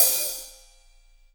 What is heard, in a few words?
HH hh; Best Service Gigapack 2